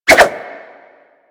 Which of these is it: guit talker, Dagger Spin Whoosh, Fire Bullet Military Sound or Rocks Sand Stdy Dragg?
Dagger Spin Whoosh